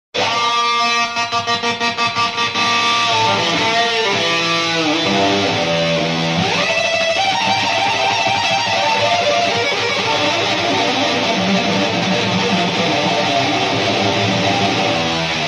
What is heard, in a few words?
instrumental, metal